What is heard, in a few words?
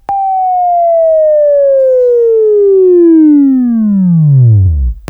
Buzz; Labview Program; Sweeping Training program